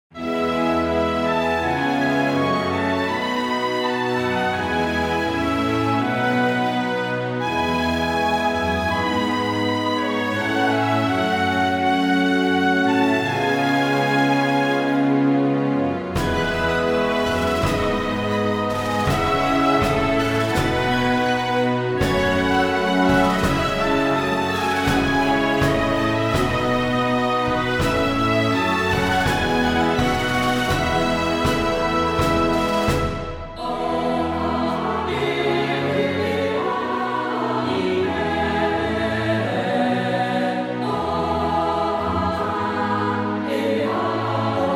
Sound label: Orchestral Version; Oh Kathal; Die Nationalhymne Kathals noch mal in der prunkvollen orchestralen Version mit Chor